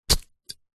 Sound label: warfare knife stab into soil dirt single